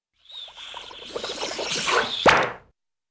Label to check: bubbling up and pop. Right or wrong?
Right